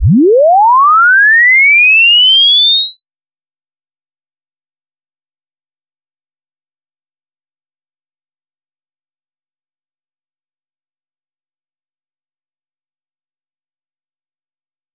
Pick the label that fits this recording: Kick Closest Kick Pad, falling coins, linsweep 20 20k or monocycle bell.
linsweep 20 20k